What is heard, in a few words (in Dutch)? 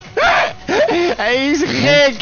lachen; willem en maxima